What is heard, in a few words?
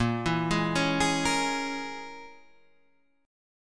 Bb Minor 7th Flat 5th, Guitar, Half Step Down